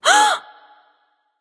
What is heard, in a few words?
breath; smite; aphrodite